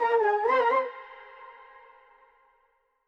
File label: VOX RUN